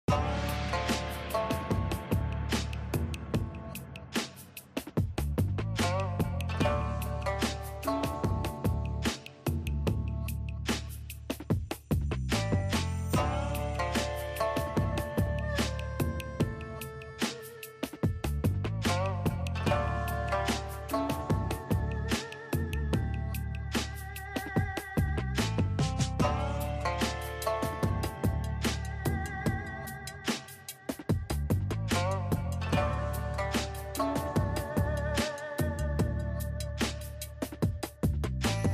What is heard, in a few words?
pause loop; Kexto; 怡璇 Yi Xuan